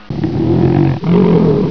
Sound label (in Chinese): 老虎; 动物; 兽类; 特酷声音素材库